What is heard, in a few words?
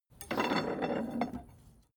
metal tools rolling; berufe; handwerker; soundpool